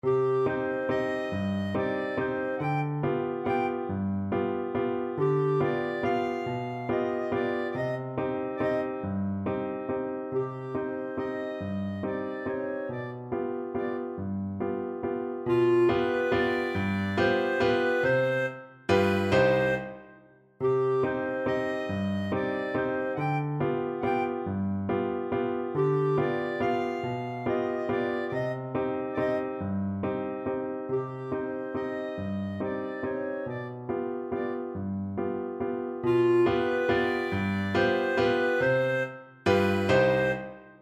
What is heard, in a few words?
dios nunca, clarinet